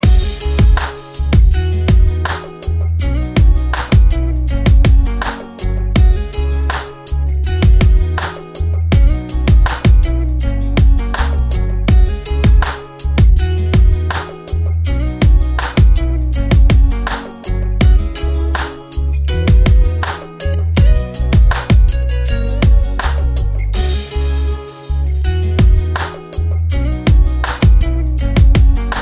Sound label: romantic guitar beat; ringtones